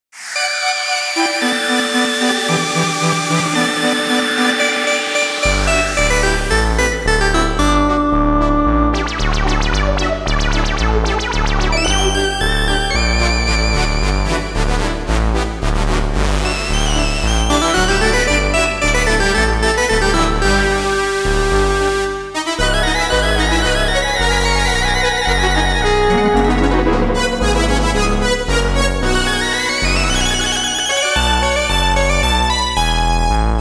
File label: synth expander module